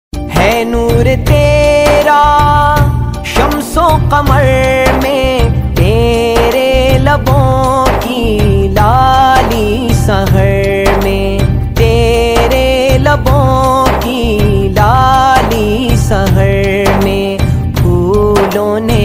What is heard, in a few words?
islamic ringtone